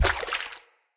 Step Water, Footsteps; Enemy; Corrupted Sproutfolk

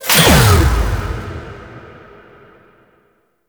Boss Take Damage; Warframe; Cephalon Weave

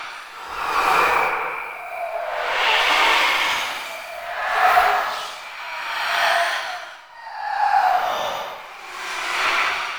summon movement loop; Warframe; Uriel; Vythelas

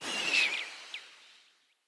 Bow Arrow; Weapons; Player; Bows; DendritBow

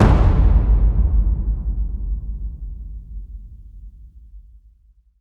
Taiko Drum; Warframe; Dojo Props; Taiko Drums

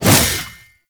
Mace Whoosh; Warframe; Duviri; Dax; Mace And Shield

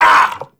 Vocal Set Pain; Warframe; Duviri; Dax

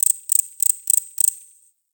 Forest Close Insect Chirp; Warframe; Grineer

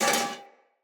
Foley Armour Step; Enemy; Mockeries

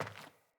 Sandals Walk Metal; Player; Footsteps

